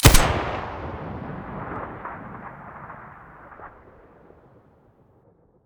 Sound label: sa58 muzzle, weapons; STALKER; IWP